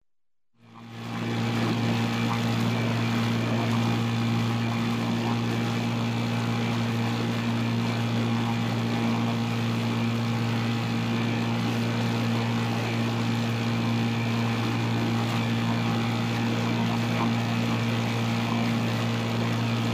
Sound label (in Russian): motornaya lodka 6 l.s. ezda s postoyannoj skorostyu